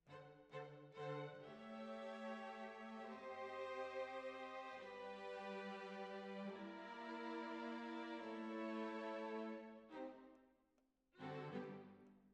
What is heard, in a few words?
Oboe